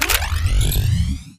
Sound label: Sentry Turret Power Up; Boost Card; Titanfall 2